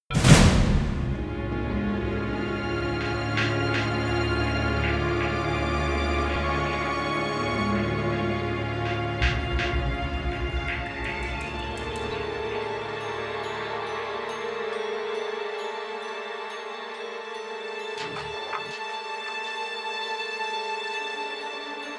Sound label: Suspense; Syphon Filter Dark Mirror